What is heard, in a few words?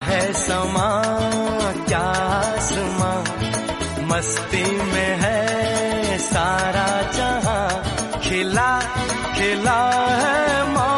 hindi love song